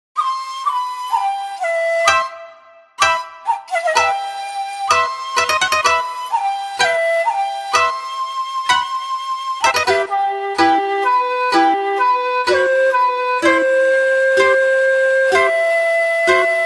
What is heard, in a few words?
Shamisen Koto Shakuhachi; ShamiKoto; Pokemon Red Blue and Yellow; Syntheway